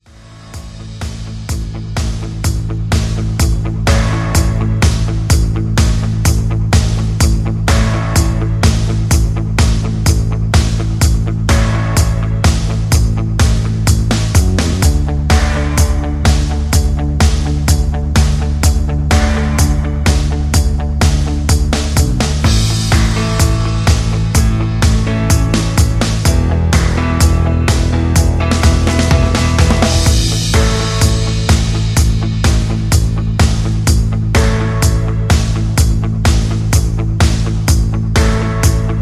Instrumental No Leads

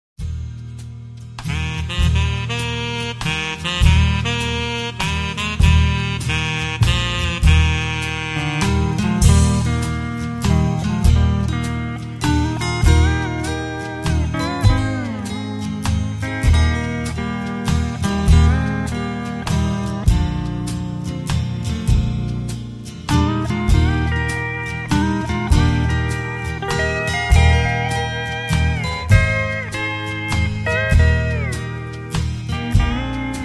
Waltz Instrumental

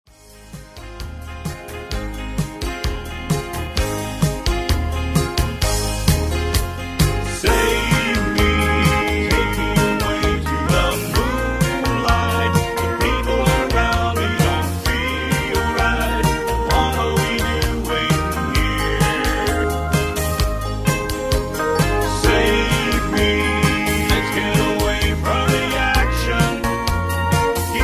Intrumental with BGVs

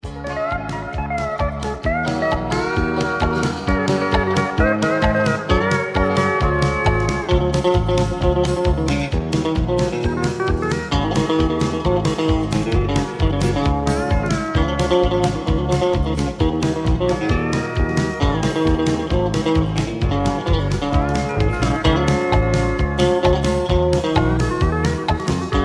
Instrumental Low Key

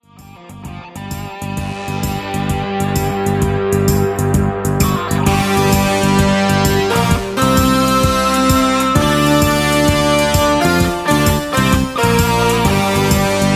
Thunder and Lightning Patter Short Intro

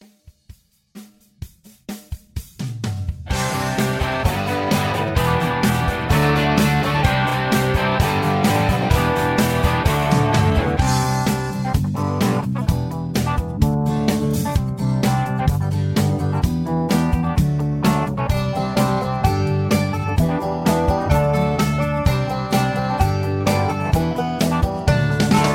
Instrumental Higher Key

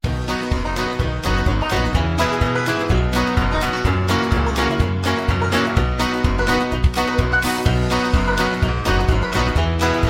High Key

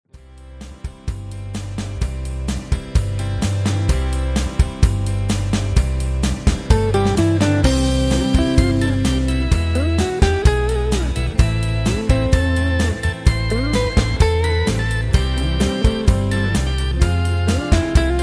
Instrumental

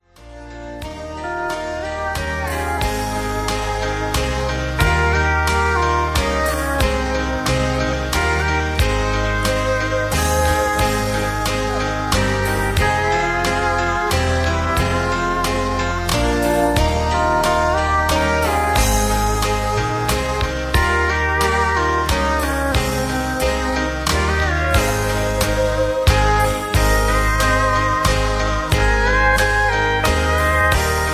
Low Key with No Leads

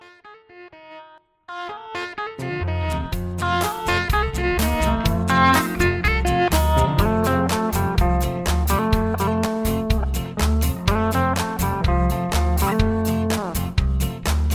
Low Key

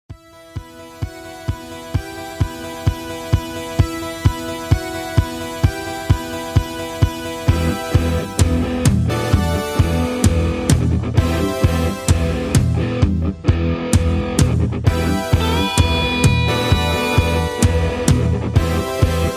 instrumental No Leads

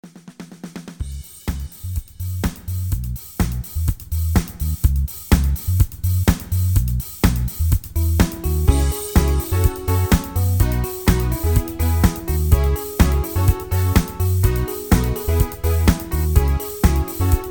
Instrumental with Melody

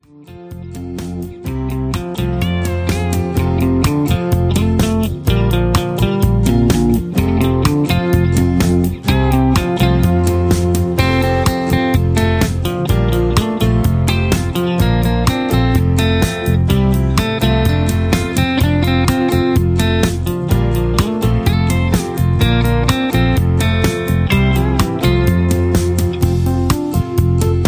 Instrumental Leads

